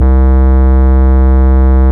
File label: Ultimate Bass Root C; Vengeance Samples